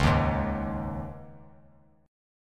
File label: C sharp Suspended 2nd, C sharp; C#sus2; strummed; JGuitar